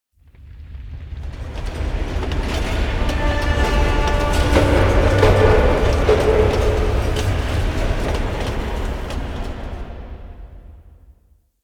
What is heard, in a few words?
Asteroid Mining Machines; Ambience; Warframe; Grineer Asteroid